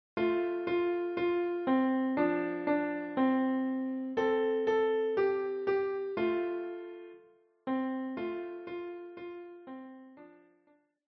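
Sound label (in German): Old Mac Donald Klavier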